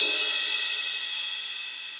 Ride Old School; Drums; Drum Hits; Session Drums Studio; MPE Hits

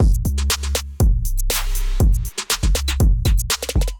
Kit, Drums; Candler Kit; Ableton; Beat Tools

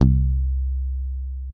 Electric Bass Palm; Guitar and Bass; Ableton; Factory Packs

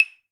Claves B; Latin Percussion; Drum Hits; Wood; Ableton; Factory Packs

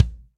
Kick Felt Steely Tight; Drums; Drum Hits; Standard Hits; Ableton; Session Drums Studio

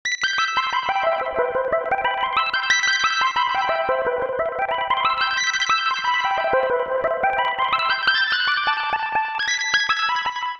Smooth Lead E Minor 90 bpm; MIDI Clips; Tonal; Melodic; Smooth It Out; Ableton; Factory Packs; Chop and Swing

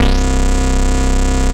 Grinder Dunk, Bass; Instruments; Basses; Ableton; Creative Extensions

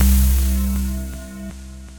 Fuzzer Bass; The Forge by Hecq; Ableton; Factory Packs

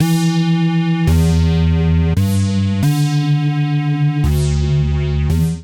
Rave Class Stab G Major 170 bpm, Bassline; MIDI Clips; Tonal; Skitter and Step; Ableton Factory Packs

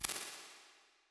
Drum Chamber, drum reverbs; Convolution; NI Kontakt 5

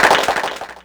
Clapbox Pitch; Ableton Foundation FreePacks

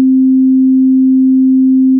Static Sine, Vector FM

